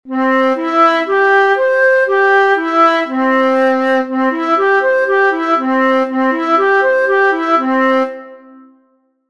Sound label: gamma do major arpedjio